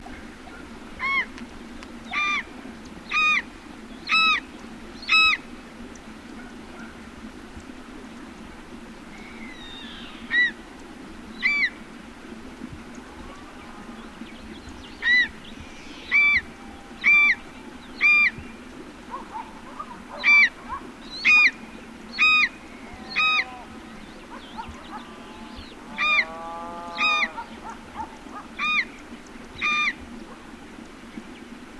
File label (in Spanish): Aguila mora Geranoaetus melanoleucus